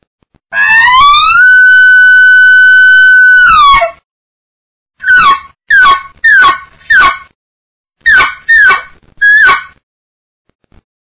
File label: bull, elk; elk 2001